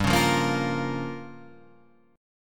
G Minor 7th Flat 5th, Guitar Half Step Down

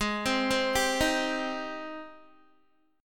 G sharp Major 7th G sharp, Guitar; G#M7 Chord

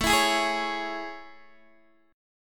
A Major 7th A, Guitar, Open D

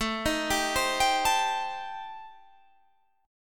A Minor 7th Flat 5th A, Guitar, Standard; arpeggiated; JGuitar

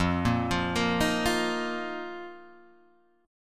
Eb Suspended 2nd, F

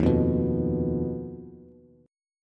Eb Suspended 2nd Sharp 5th, Bass; Ebsus2#5 strummed; JGuitar

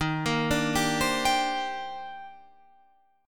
D sharp Major 13th D sharp Guitar Open F, chord; D#M13; arpeggiated

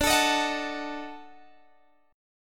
Eb Minor Major 7th Flat 5th

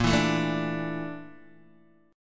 Bb Major 7th Flat 5th Bb Guitar Open D; x,1,2,3,0,0

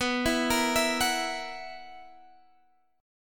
B Major 7th Suspended 4th B down; arpeggiated

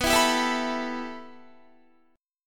B 7th Suspended 4th Sharp 5th, Guitar; B7sus4#5; strummed; JGuitar

